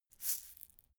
shaker plastic egg hit single soft

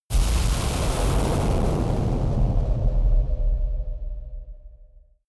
shuttle explosion

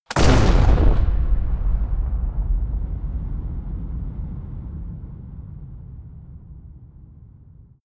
shotgun fire huge reverb rough

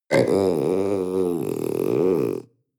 panther young grunt

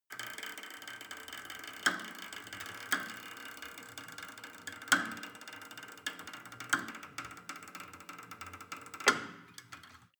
cable machine creak down

